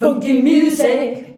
FUNKY MU C, FEMALES

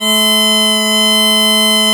BP BASS C3, Celtic; USB Soundscan vol.42 - Celtic Flavours; AKAI

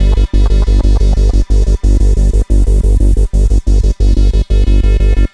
Pure Analog, Keyboards; Sonic Foundry (Sony Creative Software); Leo Cavallo Bass Taster

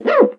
FRET, FINGER MUTE; Xsample VOL-1

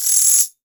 JINGLE ST, JINGLE STICK; Voice Crystal - Steve Reid Definitive Percussion

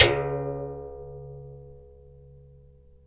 BIRIM NOR FF, BRAZIL

